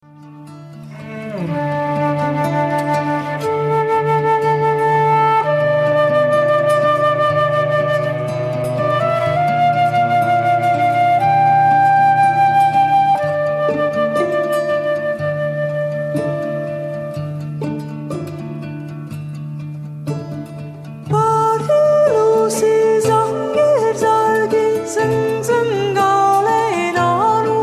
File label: Morning Bells